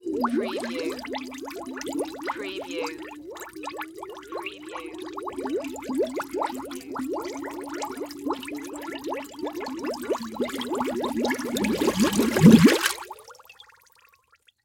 Tank Bubbles Long

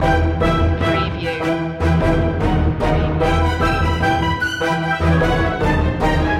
neon nightscape distance loop

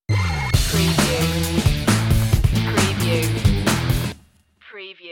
burn out stinger

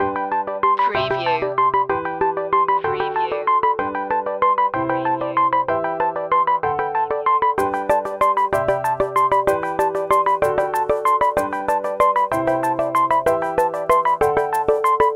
light signals loop

